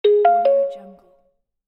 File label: sms message